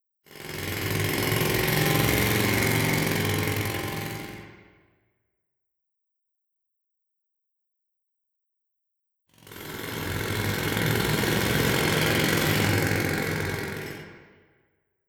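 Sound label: Cities New York City Jackhammer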